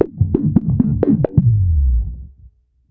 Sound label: EFX POPCORN, bass; Sampleheads; Ultra Freakin' Bass